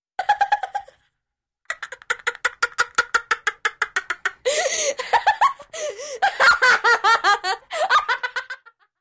Girl laughing hard

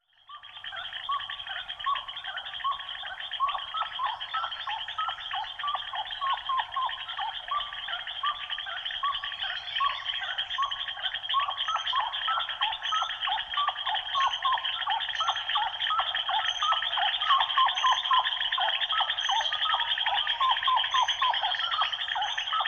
Rainforest ambience

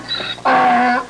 Farm donkey neigh

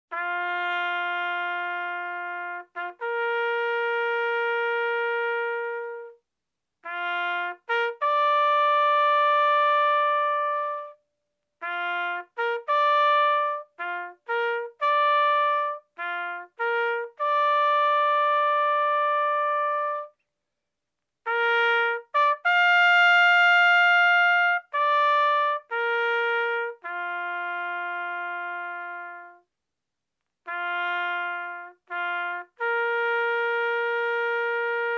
Trumpet playing fanfare melody

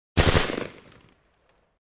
Bright machine gun fire

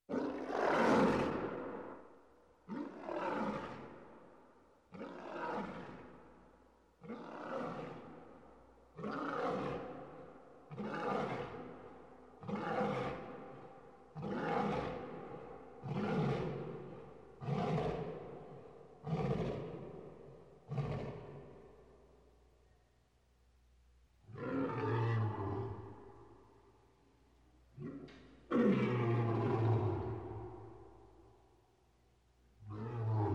Big cat roaring in cage long

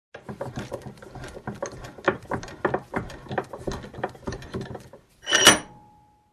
Construction

